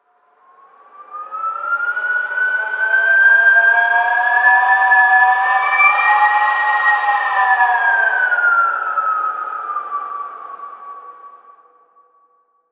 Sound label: howling wind